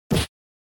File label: Fighting Hit